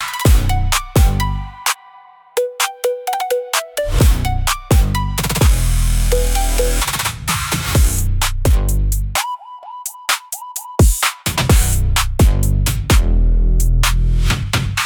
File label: loop; bgm; opening